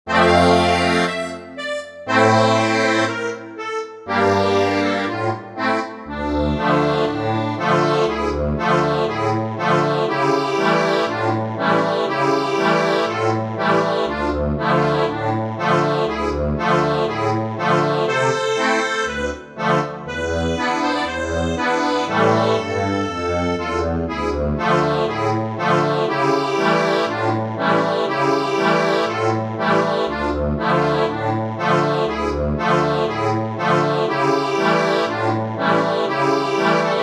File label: The Dames of Deauville, accordion